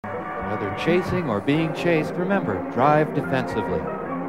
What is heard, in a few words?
drive